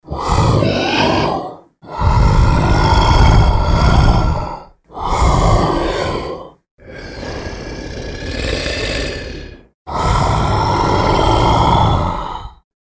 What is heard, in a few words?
deep creature growl